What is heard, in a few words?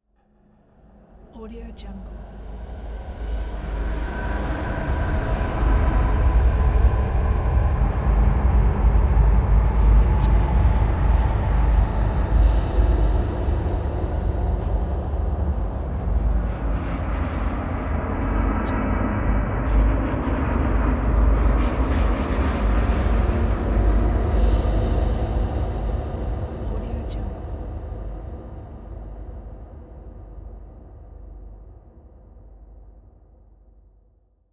dark ambiance